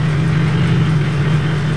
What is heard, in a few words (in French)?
dameuse